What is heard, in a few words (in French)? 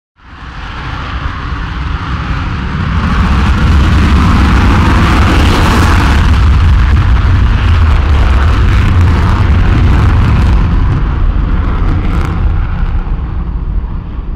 tornado decollage